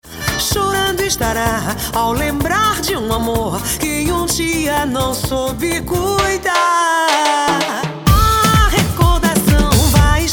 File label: BEAT